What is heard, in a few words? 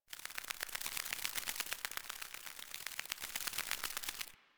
Fairy Idle Multiple Butterfly; DE; Warframe